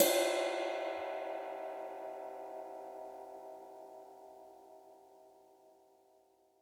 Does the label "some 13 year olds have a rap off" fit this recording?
No